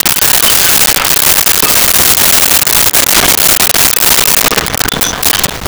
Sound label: Pickup Dishes Tablecloth; Vintage Cartoon; Foley